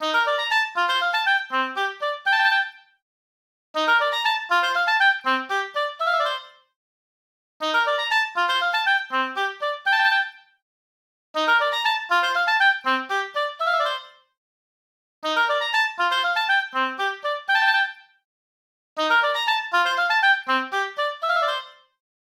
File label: Elegant Oboe, ringtones; LG Velvet